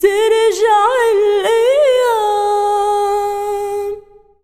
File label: SINGER, ArabianTraditions